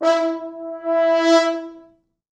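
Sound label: French horns, four, e4; East West; Quantum Leap Horns Sax; 4 FrHorns fts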